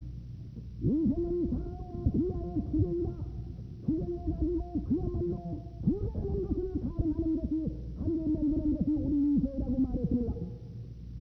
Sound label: Before Noise Clinic